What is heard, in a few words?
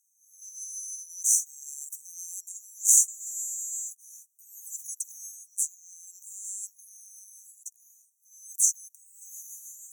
sing